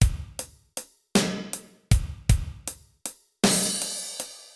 Basic Beat Hi-Hat Crash; Pro Tools Blues

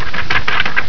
flap; Monster Media Number 15

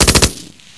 pchgun

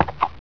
clop; Hexen II; pest

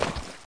FALL

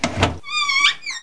door open; Hellboy

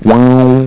TELEPORT; spispopd; smashpmp

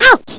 ouch

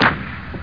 artillery; warzone

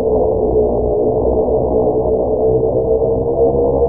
bRRRRRRRRRRRRRRR; Burning Rubber 1.2.3

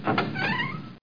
door wd op

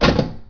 metal Light On Metal end; vampire demo; environment

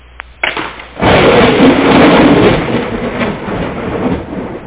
Thunder; wincraps

